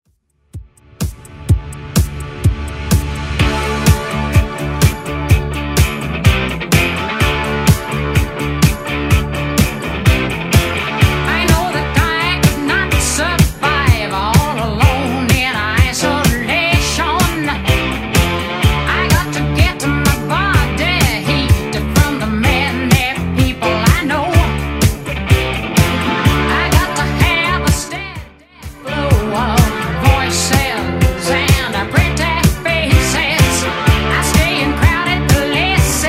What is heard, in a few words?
80s Redrum 126bpm